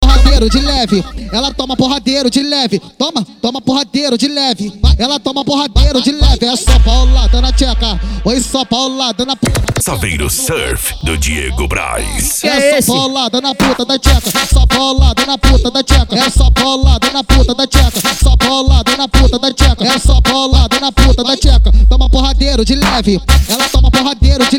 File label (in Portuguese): funk consciente e funk ritmado